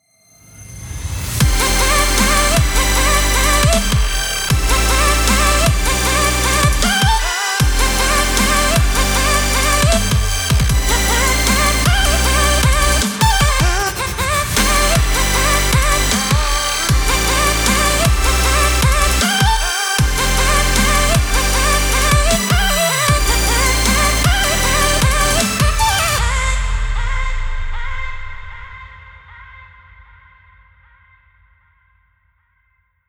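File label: Mix Master FX Comp On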